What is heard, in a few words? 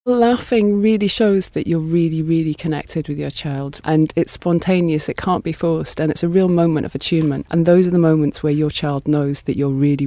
Laughing with your baby